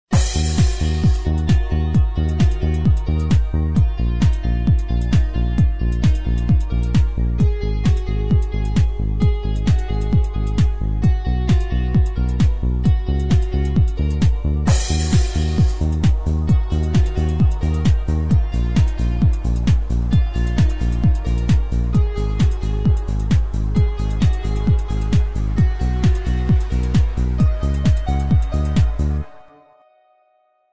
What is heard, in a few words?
instrumental